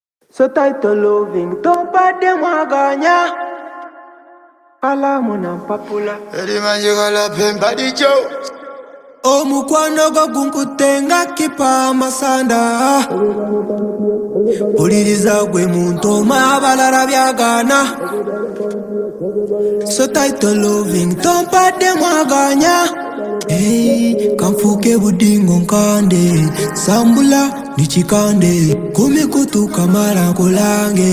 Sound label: Acapella Vocals